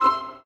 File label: checkpoint; Golden Crayon Sounds